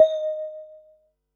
Perc, Percussion and FX; Pearl SC-40

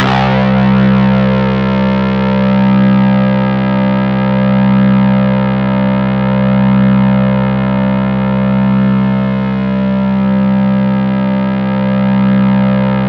C2, Synth Line; 90 s Sample CDs; NiceBeats; SynthLine VOL-33

